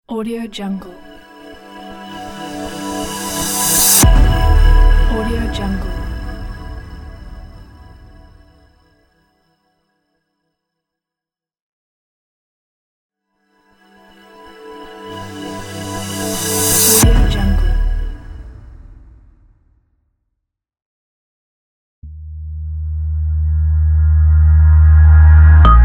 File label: logo reveal pack